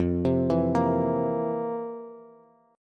F Major 7th Suspended 4th F, Bass; FM7sus4 arpeggiated; JGuitar

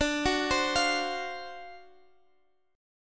D 7th Sharp 9th, Guitar Half Step Down

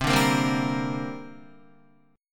C 7th Suspended 2nd, Guitar; C7sus2 strummed

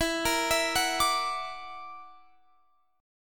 F sharp Augmented 7th E, chord

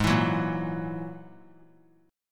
Ab 7th Suspended 2nd Sharp 5th, 3C,3F,3A,3D; Ab7sus2#5 strummed; JGuitar

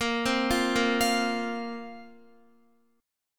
A sharp Suspended 2nd down Guitar; A#sus2 arpeggiated

